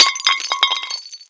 glass pottery large break; physics

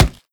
cardboard, bullet impacts; physics; Half Life Model Pack

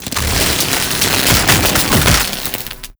shockwave tell

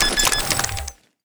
c4 disarm start; weapons; Counter Strike Model Pack; CS2

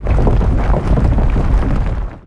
rumble, antlion dig; creatures

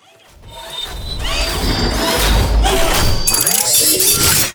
drag intro, machines; ambient; Portal 2

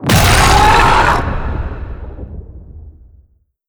vox revived screech, zombie

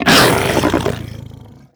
grab react player, barnacle; Half Life Alyx

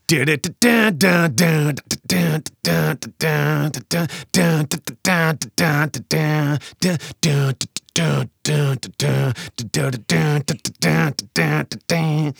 taunt song; Counter Strike; CS:GO

